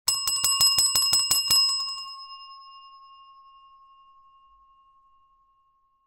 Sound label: BELL DESK